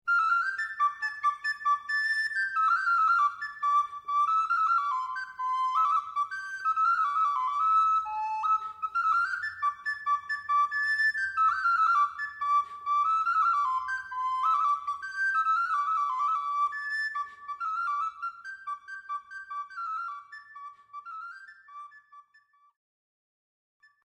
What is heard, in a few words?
saltarello